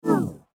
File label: Bar chart fade out animation infographics